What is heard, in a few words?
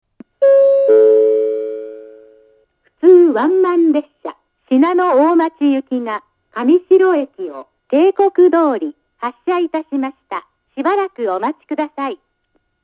yanaba skiing ground nobori kamishiro hassha; 上り神城駅発車案内放送; 普通ワンマン信濃大町行の放送です。; 長野県大町市; 大糸線 白馬・信濃大町・松本方面 南小谷方面